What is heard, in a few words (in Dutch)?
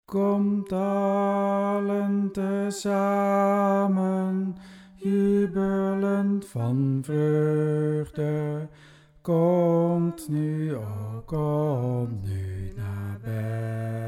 Komt allen BAS